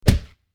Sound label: mobs damage